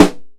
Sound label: Rim; Digital Sound Factory Protean Drums